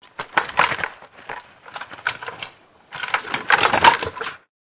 Gun Short Moves, handgun

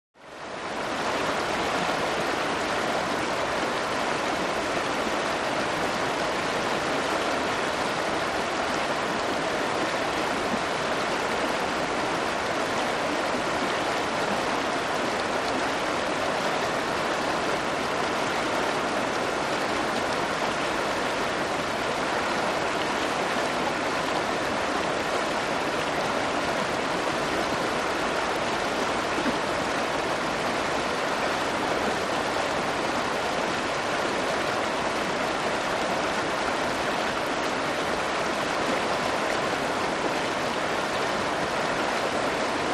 River Medium Close

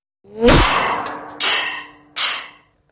Bullet Impacts Sheet

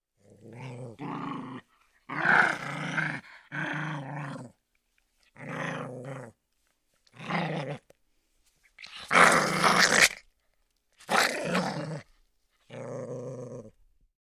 Dog Maltese Growl Ang

